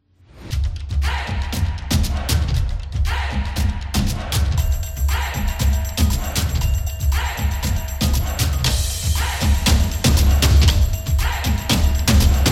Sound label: Soccer Sport, percussive